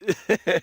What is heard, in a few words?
giggle; dmc; dante